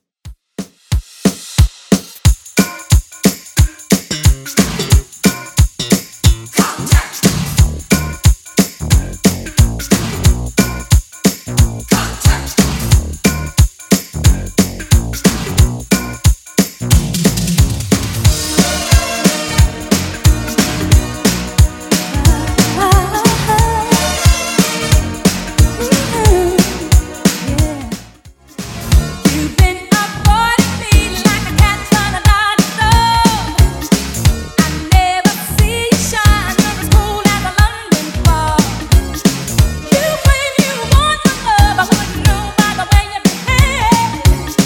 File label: 80s Redrum 90 bpm